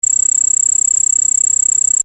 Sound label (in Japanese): higeshirosuzu, insects